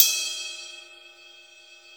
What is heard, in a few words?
CYM Ride; Best Service Gigapack 2